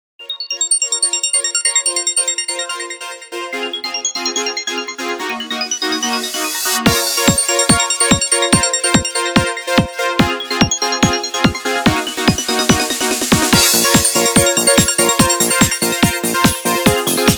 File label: Hummingbird, Nokia 6110 Navigator